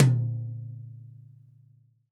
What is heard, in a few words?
TD-20 Tom; Toms